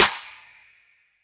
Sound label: Drum Snare